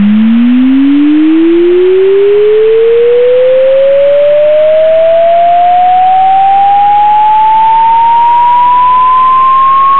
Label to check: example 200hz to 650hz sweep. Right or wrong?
Right